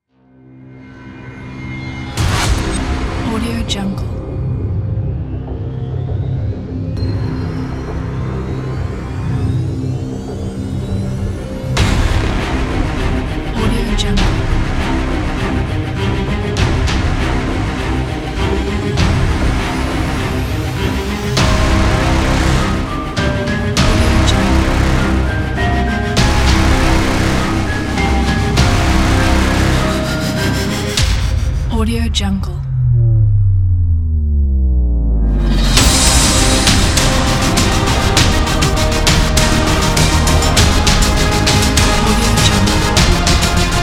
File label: intense cinematic